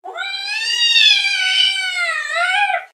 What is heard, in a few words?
4 syllable loud annoyed followed by two smaller annoyed; sylvester the cat